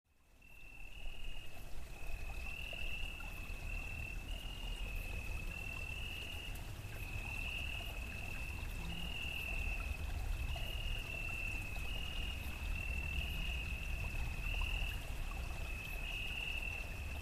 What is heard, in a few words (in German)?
Wasser Mi Fa Zikaden Hund und Wind hall